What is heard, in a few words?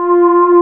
AN SYN, SYN & LEAD; SAMPLE WAVE MIXING 2